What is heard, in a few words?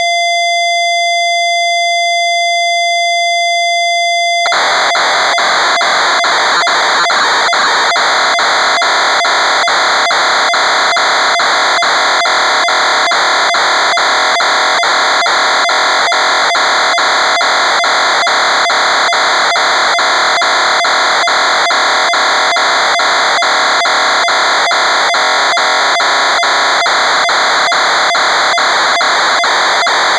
CLOCK